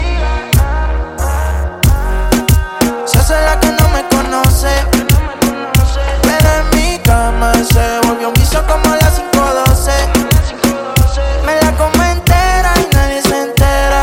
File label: Ringtone